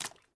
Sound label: fs mud, footsteps